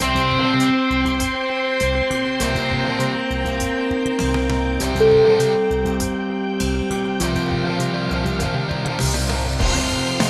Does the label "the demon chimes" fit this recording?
Yes